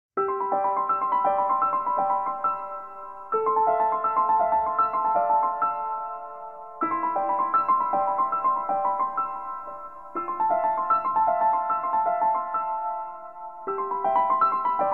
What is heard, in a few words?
piano house